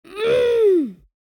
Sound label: Little boy pain grunt